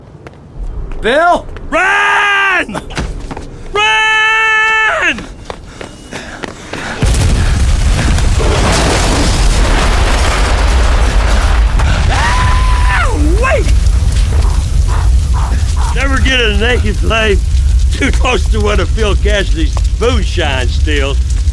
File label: Explosion